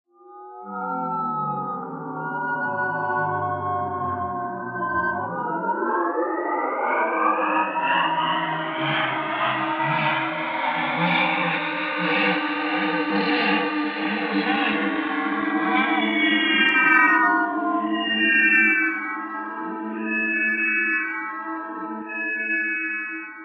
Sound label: Banshees; soniccouture flowstate; Kontakt